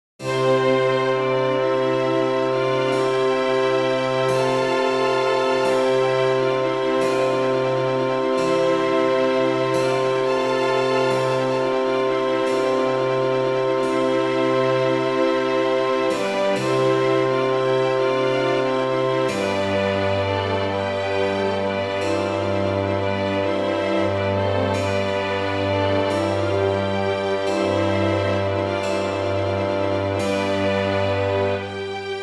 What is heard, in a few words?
Pifia Pastoral Symphony